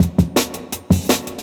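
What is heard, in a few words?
Jungle Breaks, 167 bpm; Original Jungle Breaks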